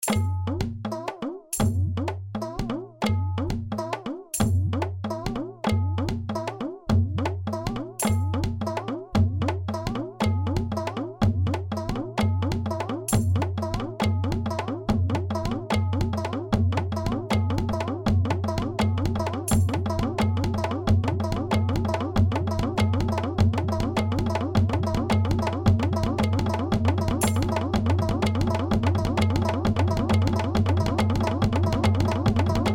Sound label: JI percs; fib t one sixteenth indian